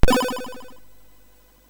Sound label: Bomb Chase Revival SFX, sid MOS6581